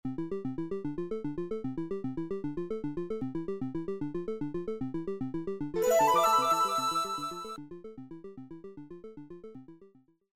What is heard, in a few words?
win; Blessings Machine